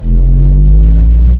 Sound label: decay